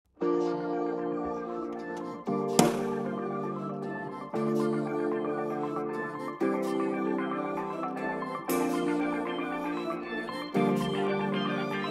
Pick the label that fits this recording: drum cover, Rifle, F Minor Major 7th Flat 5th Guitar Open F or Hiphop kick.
drum cover